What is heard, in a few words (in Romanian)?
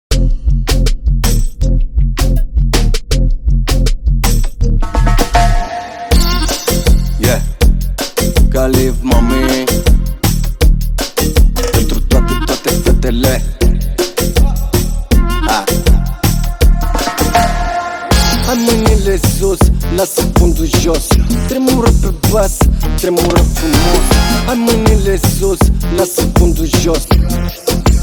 Tremura Pe Bass